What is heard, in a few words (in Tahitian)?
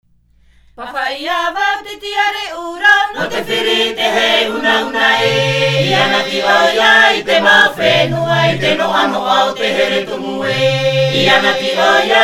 Himene tarava Tahiti